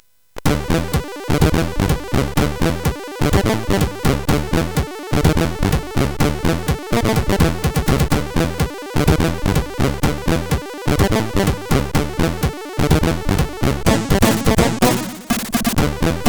Beat, CSG8580R5

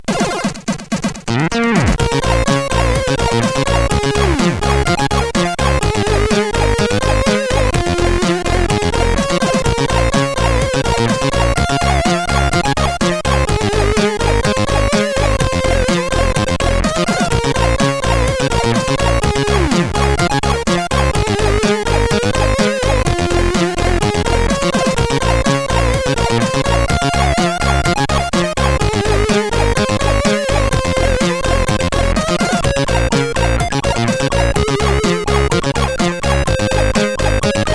Dreamy, CSG8580R5; sid; hvsc

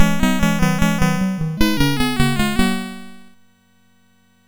Narrow Squeaks, MOS6581R4; Orm and Cheep

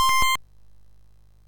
sid MOS6581R2; Jumpman

